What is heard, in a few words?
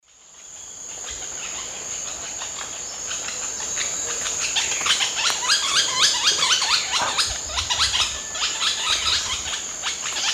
Automolus infuscatus, calls pair; S. America; Eastern Lowlands